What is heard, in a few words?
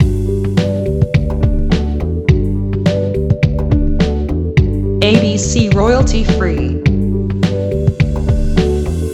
Commercial Background short loop